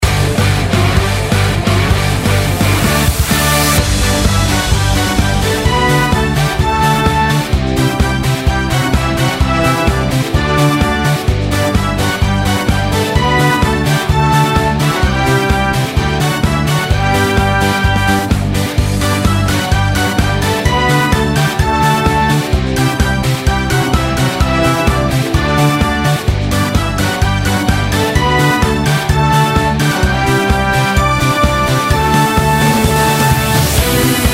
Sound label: Raid; Mist Train Girls